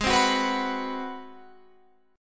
G sharp 7th Sharp 9th G sharp, down, Guitar, Open F; strummed; G#7#9